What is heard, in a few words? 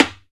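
hi timbale closed; E-MU Producer Series Vol. 2 – More Studio Essentials; Composer; Timbales Xswitch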